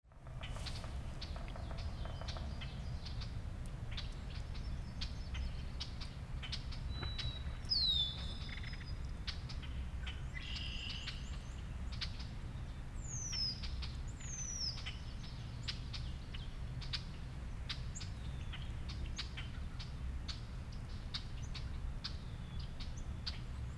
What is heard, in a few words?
Marsh Sounds; Wisconsin